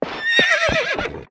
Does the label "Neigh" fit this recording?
Yes